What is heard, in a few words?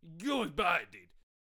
Vlad Attack Vocals